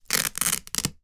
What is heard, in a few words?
foley velcro; sound effects one